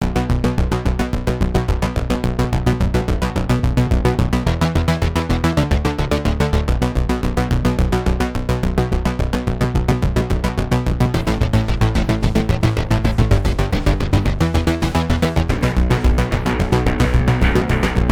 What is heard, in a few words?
Escort Ambient Loop; Transformers: Revenge of the Fallen; PS3; Windows; Xbox 360